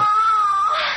female orgasm

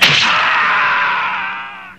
scream male longish; ooh ahh

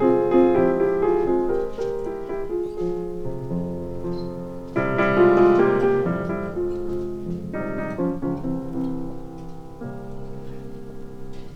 piano, sad loop

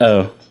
"oh"

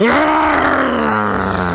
growl; Voice; ooh ahh

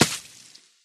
splat

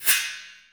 flash, Misc Percussion, Swish

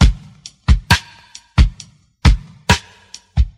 break down of everything, Drum loops Boombap

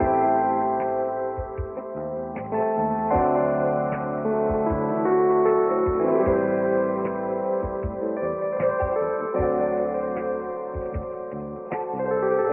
keys, phonky loops

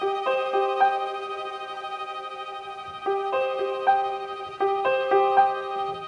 piano love lesson, Sad

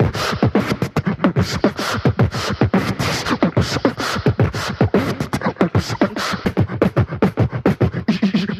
fresh beats, Beatbox; Drum loops

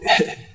heh, Voice

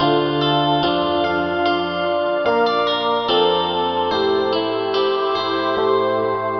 keys

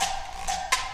zipping, drum loops

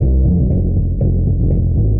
bass, Loops, Phonky

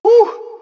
woo

